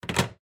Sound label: close; Treasure of Nadia